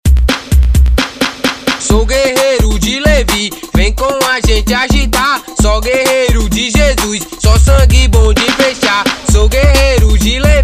gospel funk

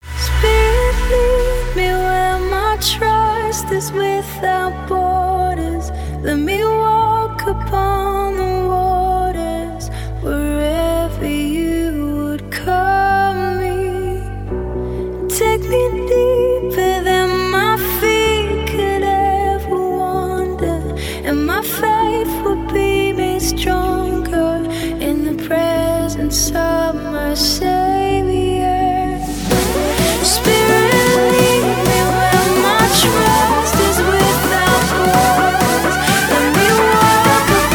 remix

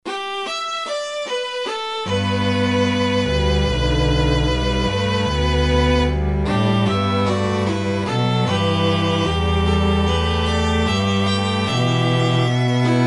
instrumental

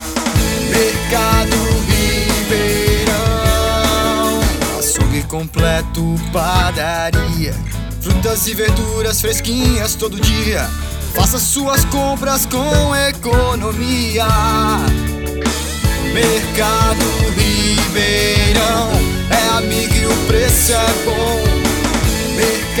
jingle; ribeirao

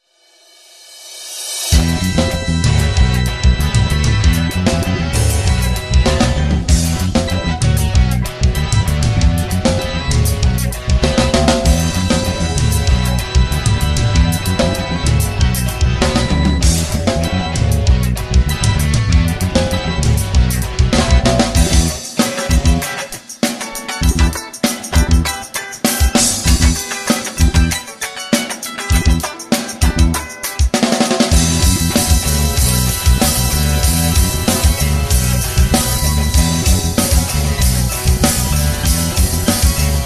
reggae roots

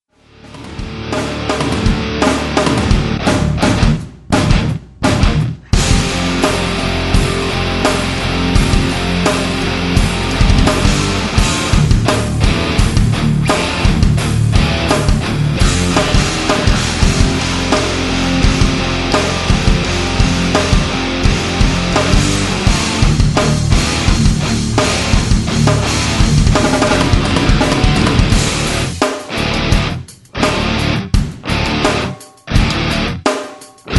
instrumental

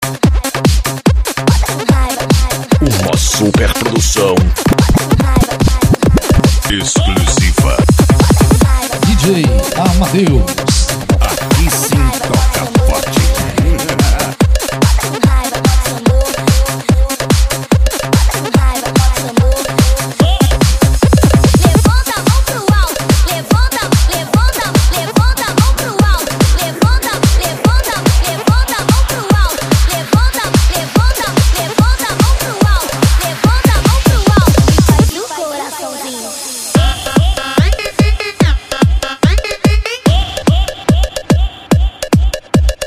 funk

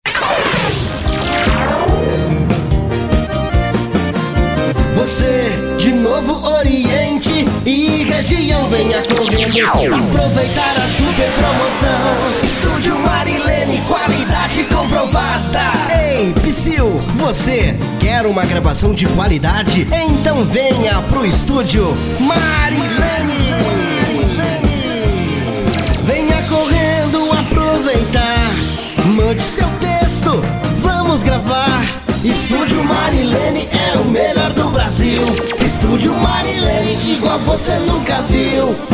jingle; studio marilene; magrynne

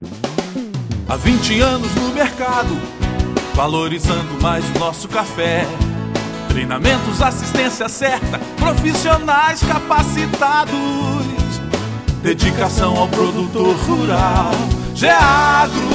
jingle; geagro

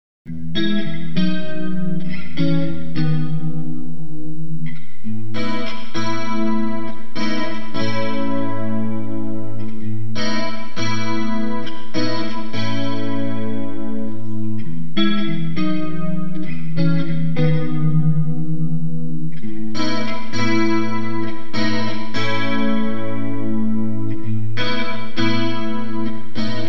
rock guitar

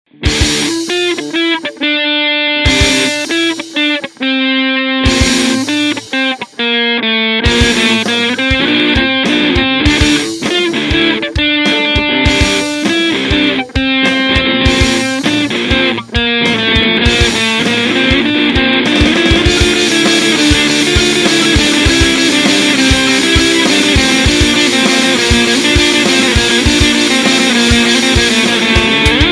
intro hardcore

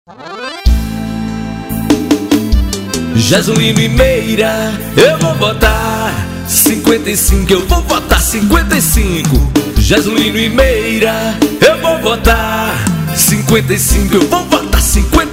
jingle

